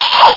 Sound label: die; zombie